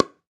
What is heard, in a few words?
PickelballPaddle Hit Ball Light